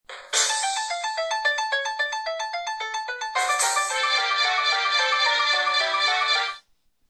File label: tune Bach fugue D minor, BlackBerry Bold 9700